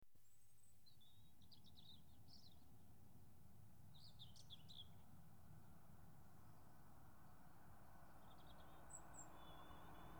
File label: Trains Passing Inter City 125; The BBC Sound Effects Library